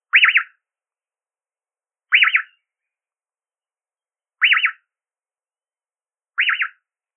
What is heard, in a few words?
Male High whee-oo